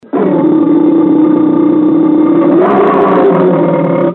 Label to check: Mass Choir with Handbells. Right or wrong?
Wrong